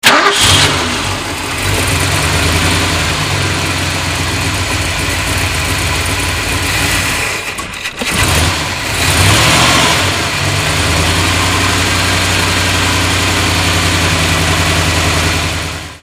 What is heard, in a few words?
1981 ford f-100 modified